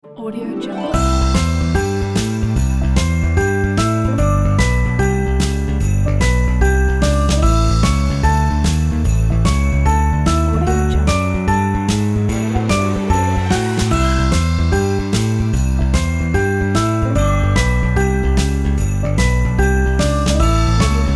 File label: joy christmas ident